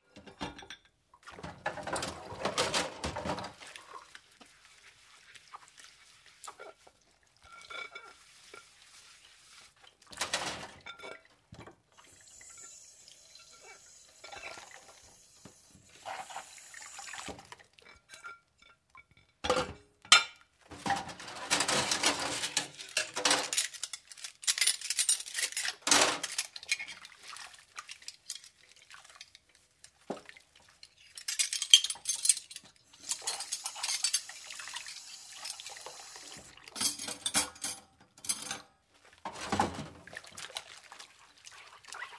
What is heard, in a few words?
Wash dishes by hand; tableware